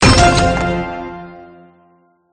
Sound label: harvest gem found; sfgame